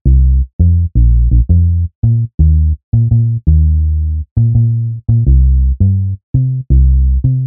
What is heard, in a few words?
Baby bass; multitracks